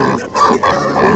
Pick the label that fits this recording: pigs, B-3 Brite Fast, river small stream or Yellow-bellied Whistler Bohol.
pigs